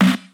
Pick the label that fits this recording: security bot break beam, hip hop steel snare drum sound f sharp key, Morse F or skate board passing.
hip hop steel snare drum sound f sharp key